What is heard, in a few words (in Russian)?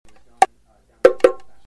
bongo melodiya prostaya zvonkaya